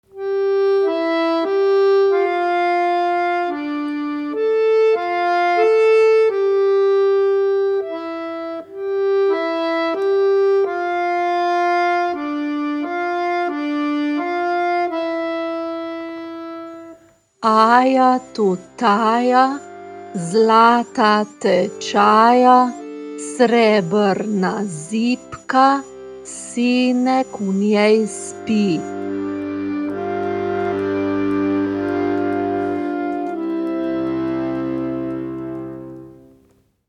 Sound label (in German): Melodie Aussprache